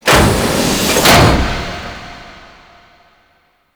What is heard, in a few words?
Shipyards Tram Door Open; Grineer